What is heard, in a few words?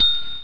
TYPE BELL